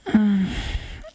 うぅん…

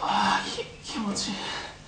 「アッー… キッ、キモチィ…」

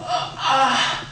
「アッ、アアッ↑」

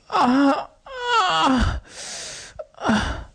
「あぁん… ンァァァァァァァァ… あっ…」

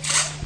みずみずしい音(音圧強い)

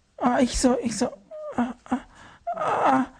「アーイキソーイキソー、アッアッ… アァ…」

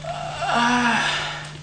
「ァァァアッー(さわやか)」

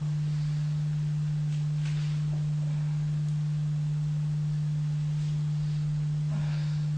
バイブ ソロパート

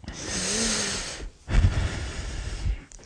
「すぅぅぅぅぅぅぅーっ、ふーっ」

